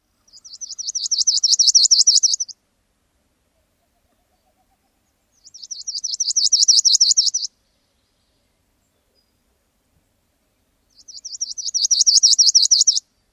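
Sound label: palm warbler songs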